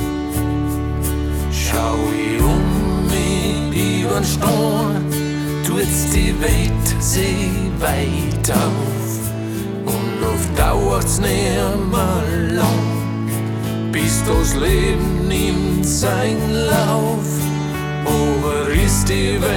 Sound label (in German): Hymne